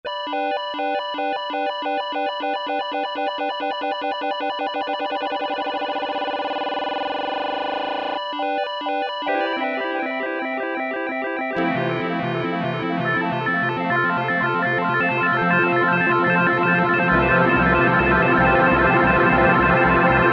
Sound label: Se-Texture6 VS, Yamaha EX5R; Factory presets